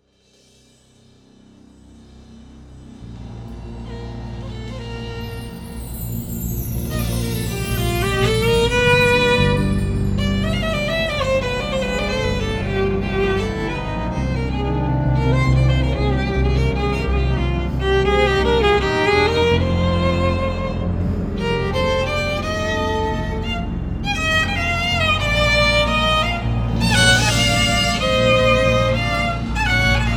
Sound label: concerto rhythm changes